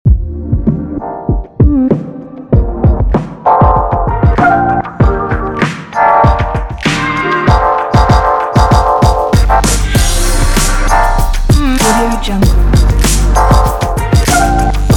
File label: hip hop old school; az-studio